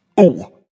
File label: Vocal Deep Ooo 110